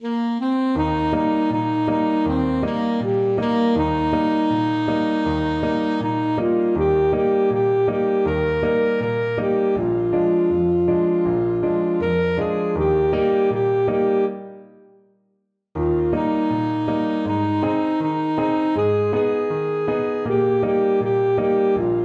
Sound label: coming round the mountain, saxophone